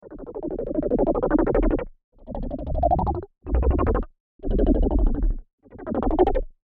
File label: 5 Pulsating Whooshes; Whoosh Sound Effects